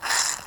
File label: Juicy Splash